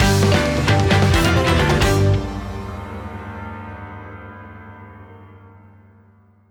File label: Boss Battle Victory (Short); paper mario the thousand year door complete